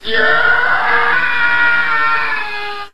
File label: were transform; minecraft; technic